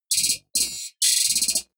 typewriter

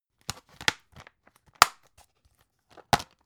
dvd case handle open close

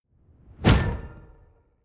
sci-fi damage zing horn high

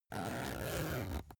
zipper use

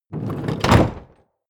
chest wood medium close

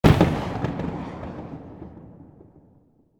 explosion

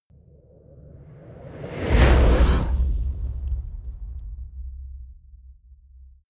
whoosh fire torch hit

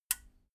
metal button radio push power on

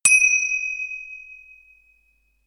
tinkle

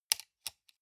sunglasses plastic side piece fold

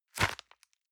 envelope grab hard

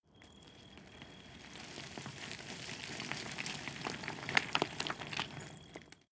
stone small landslide

